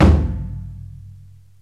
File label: OHDAIKO; Crypton - DrumBase5000; JAPANESE PER